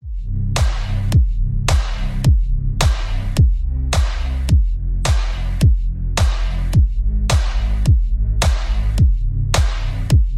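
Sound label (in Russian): music for the car bass boosted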